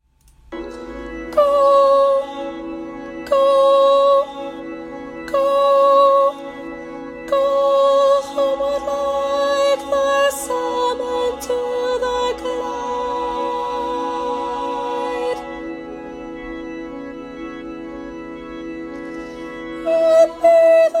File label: tenor